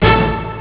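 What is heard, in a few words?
orchestra hit instrument; sonix; Rise Of The Dragon; chipmusic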